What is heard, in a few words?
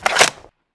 tmp clip out